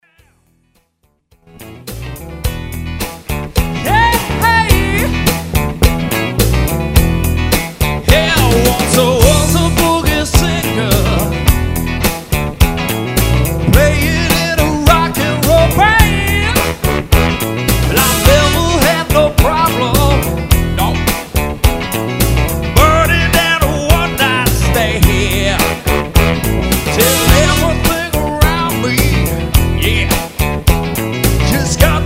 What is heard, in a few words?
Funky